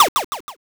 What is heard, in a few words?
shot